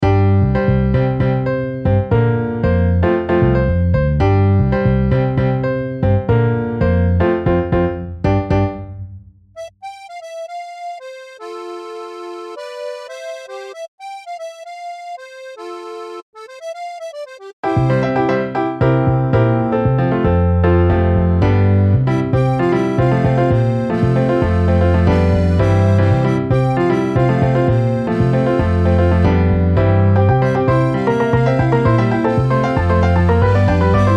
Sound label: Piano Bass MIDI